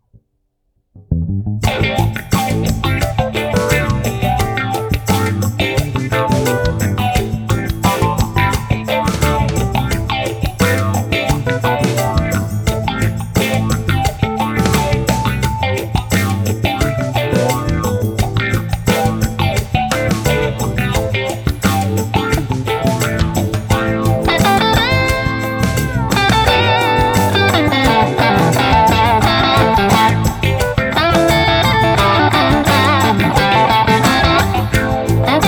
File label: Project Funky; admiralbob77